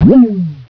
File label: LAUNCH